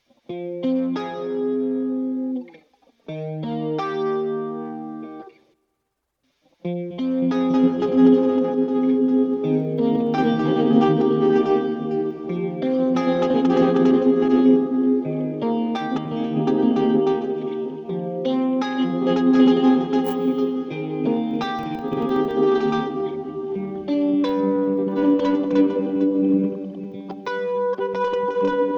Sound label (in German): gitarre